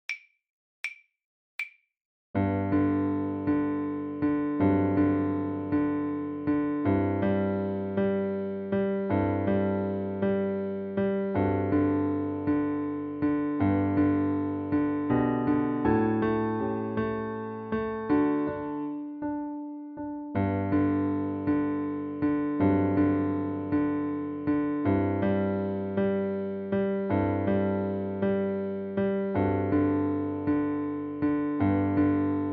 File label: Etude accomp, level2violin; The Violin Fun Book (Level 2); Etude #4